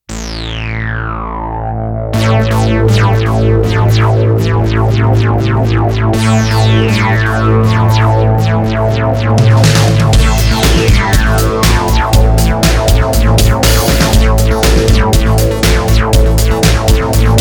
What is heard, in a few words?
bass pedals